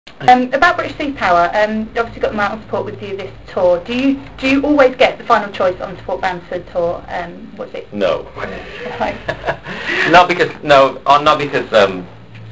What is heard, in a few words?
drinking